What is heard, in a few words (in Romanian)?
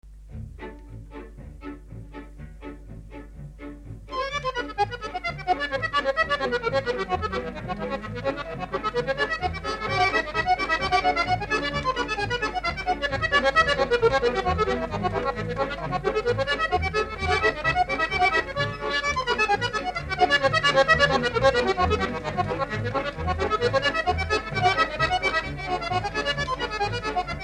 acordeon, Hora de concert